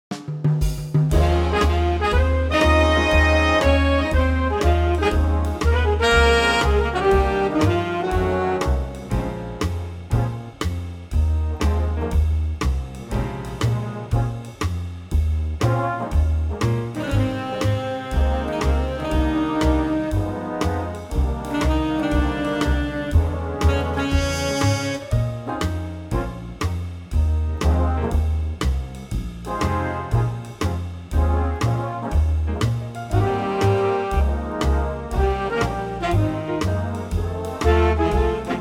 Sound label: Big Band Ab